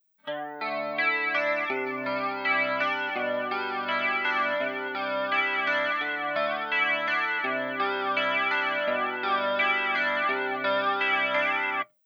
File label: flanger